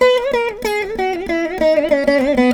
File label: VEENA; Spectrasonics; Heart of Asia